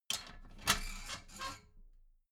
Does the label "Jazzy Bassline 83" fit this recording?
No